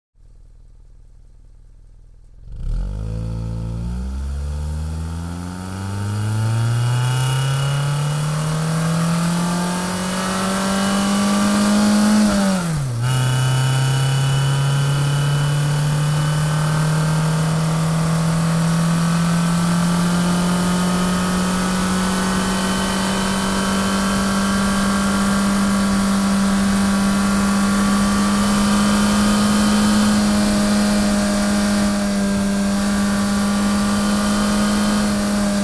Sports Car; Short Idle Accelerate To High Rpm Variable Steady. Sudden Deceleration With Backfires, Slow To Idle. Light Brake Squeak With Stop.